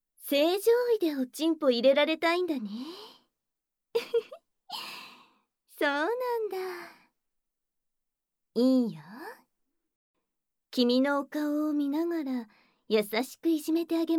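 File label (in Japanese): 効果音なし, キス・耳舐め・ささやき; 正常位で優しく犯してあげます。; 逆アナル正常位・キス・乳首責め・手コキ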